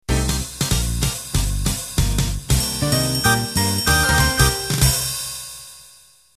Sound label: Ballroom Swing End